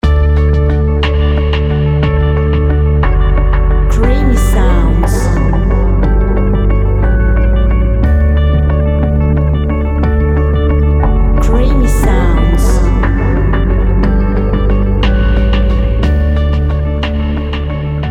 creation musicale high tech planant

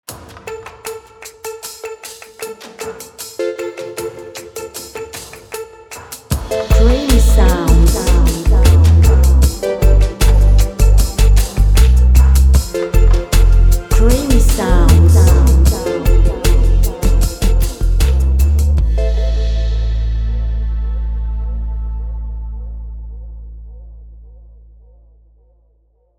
creation musicale high tech lent

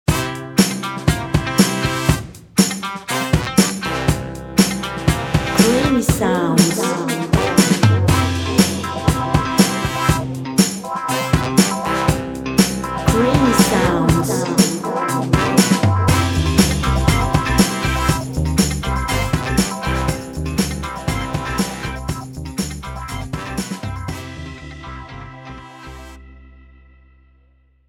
creation musicale happy motown

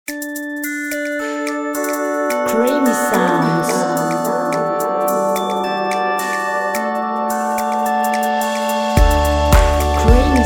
creation musicale electro high tech

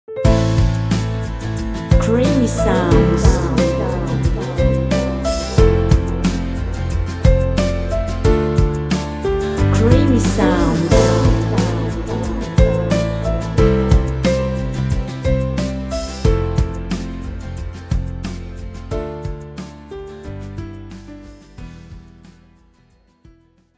creation musicale piano melodique

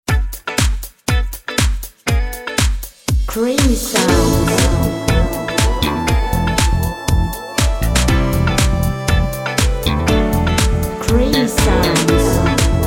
creation musicale funky 90s

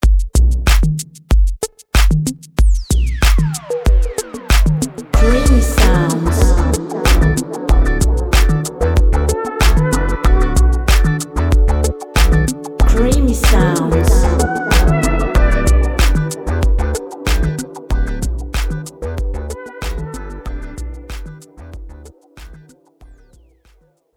creation musicale electro funky

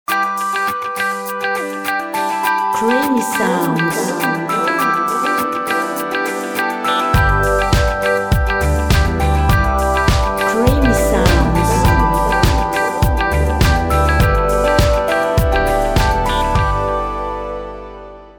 creation musicale happy pop

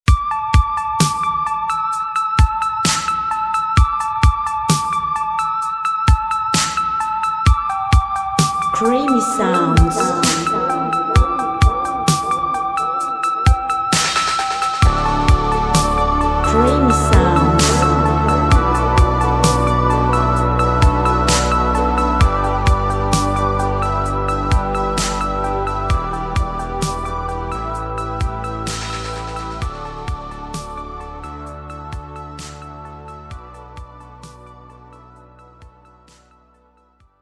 creation musicale high tech

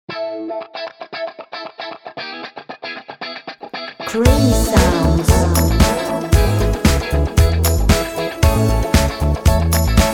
creation musicale happy funky

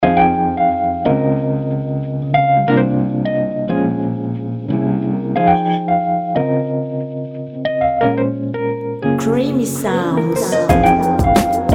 creation musicale hiphop old school

